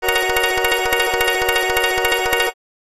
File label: ringtones; Tansy; HTC One M9